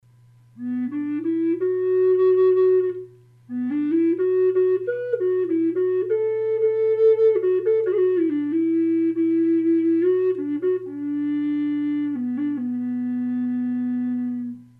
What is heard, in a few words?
horn owl B flute